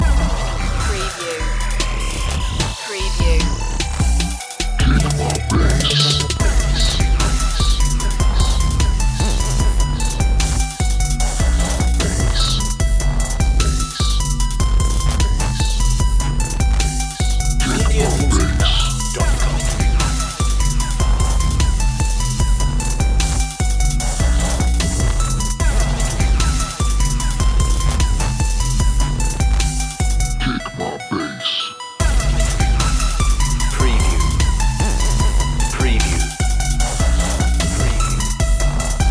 kick my bass

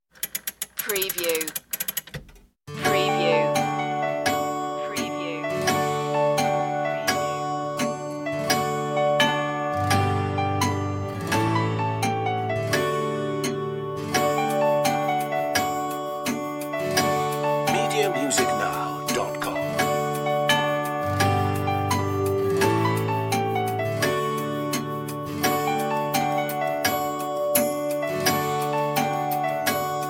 instr

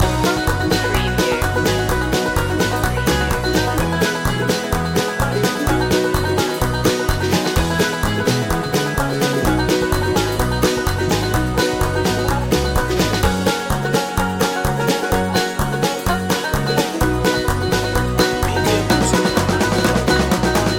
cowboy hat loop

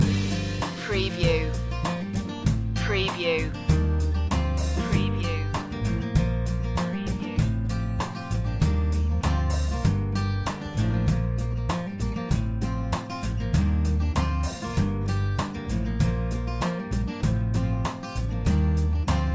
folk loop

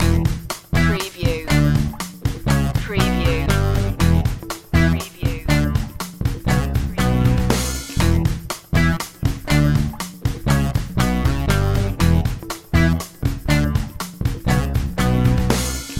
funky strut loop

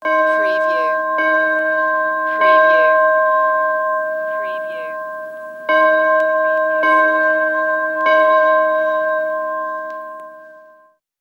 Mission Bell Tolling

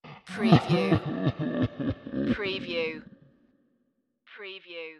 Ghostly Sob

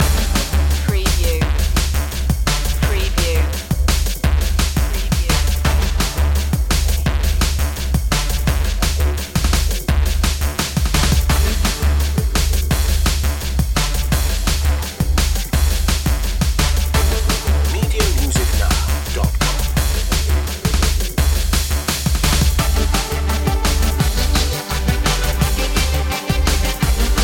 feel the groove

